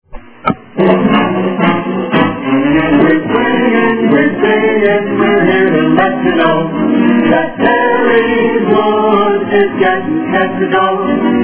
jingle; 990 WHOO

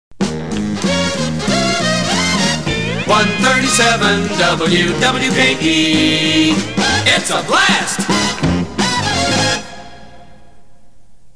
jingle Its a Blast; WWKE

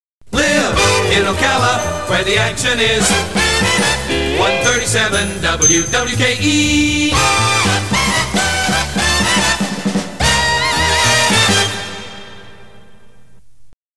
jingle Live in Ocala; WWKE

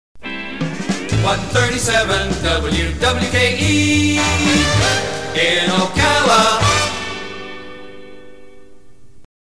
jingle; 137 WWKE in Ocala

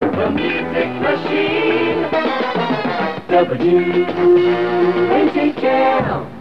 The Music Machine Jingle; WHHL 1190 AM

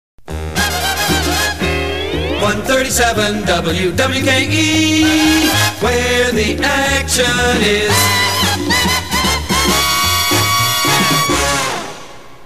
jingle Where the Action Is; WWKE

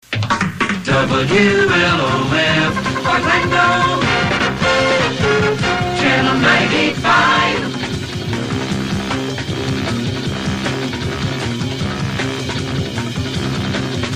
long drums out; 950 WLOF-AM; wlof orlando ch95

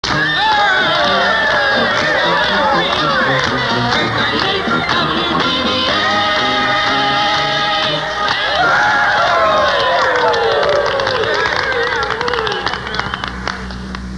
Cheer; 980 WDVH AM

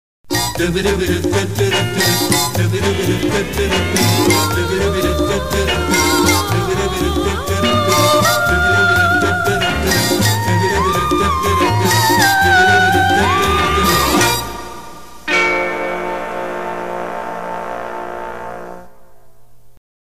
jingle Music Bed; WWKE

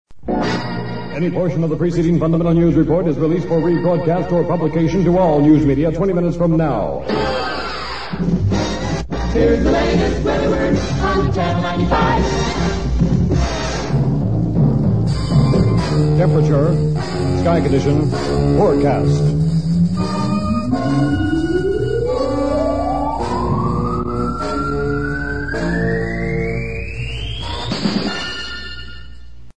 News Close

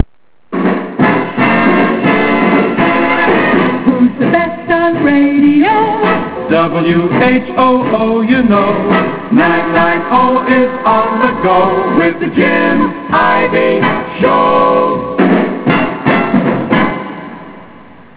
jingle

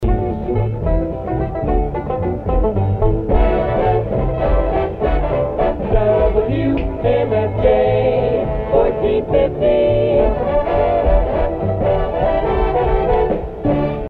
jingle; 1450 WMFJ

